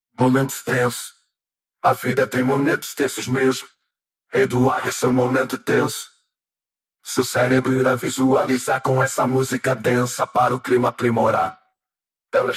Vocals

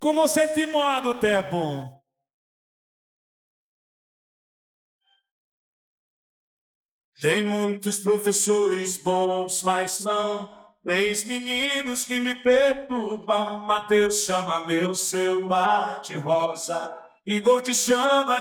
Vocals

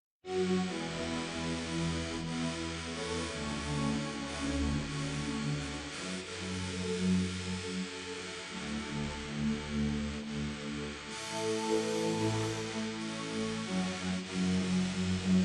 Synth

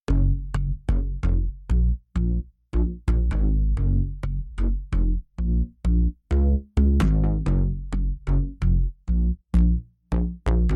Bass

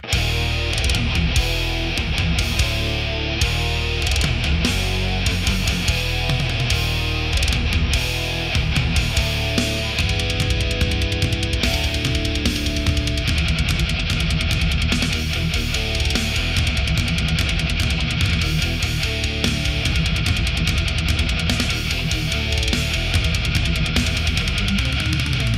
Instrumental

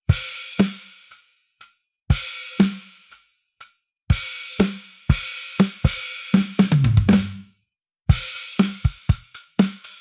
Drums

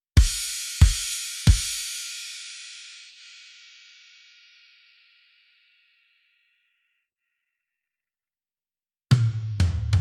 Drums

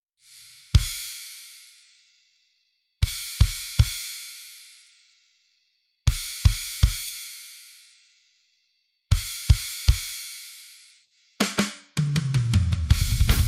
Drums

